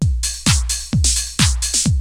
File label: LOOPS, TECHNO; Syntec; Wall of Sounds; TECHNO-BOX